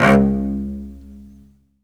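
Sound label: solo cello, strings; Synclavier; ILIO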